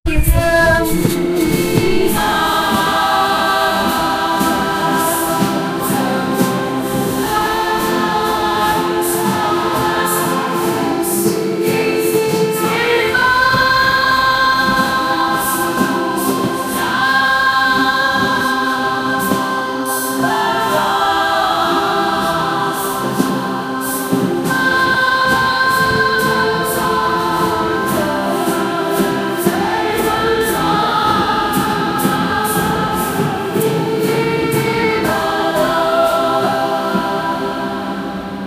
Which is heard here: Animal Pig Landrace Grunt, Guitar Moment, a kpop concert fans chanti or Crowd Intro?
a kpop concert fans chanti